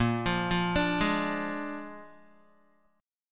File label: A sharp 7th A sharp, Guitar; A#7; arpeggiated